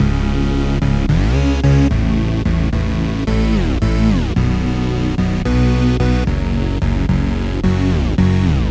big bass, made by layering a handful of different synths -- some providing a really "meaty" low-end, and others providing a more middle-range detuned sound.; Rhythm Quest; The drums get a little changeup too! Most notably, I switch to a halftime beat